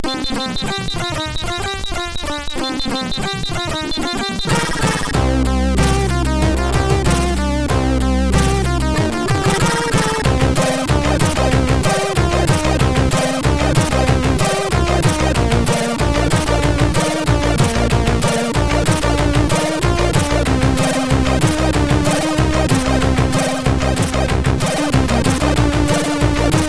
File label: sid MOS6581R4